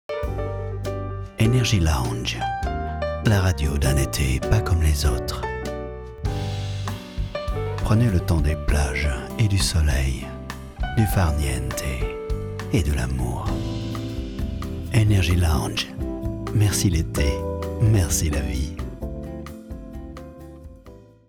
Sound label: Energy lounge